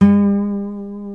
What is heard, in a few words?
A NYLON, Guitars